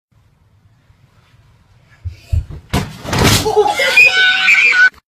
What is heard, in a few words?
boy screams